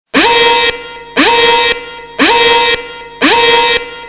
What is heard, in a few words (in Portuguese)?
alarme